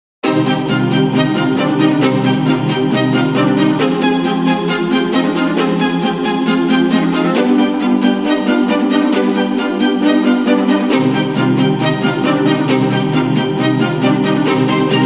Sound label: violin